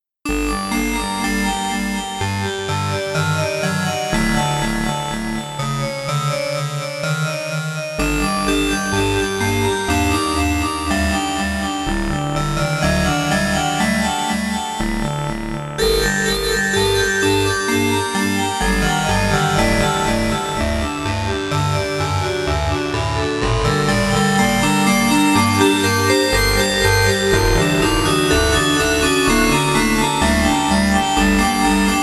Kawai

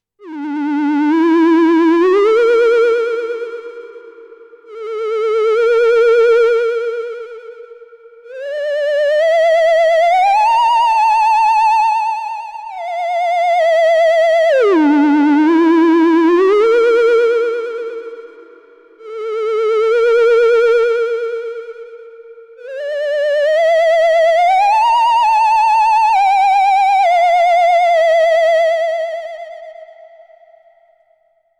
Synthesizers A1 Theremin Reverb

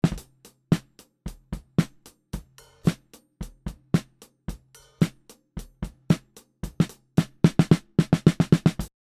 Snare Polardesigner Cardioid Front

